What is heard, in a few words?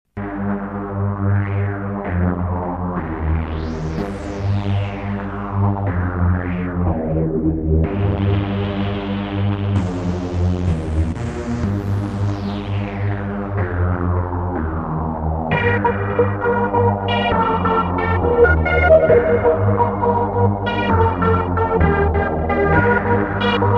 ACID SOFT PAD